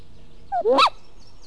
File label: great grey fem